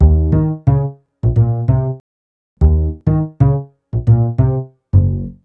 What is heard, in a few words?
Happy Upright, Upright Bass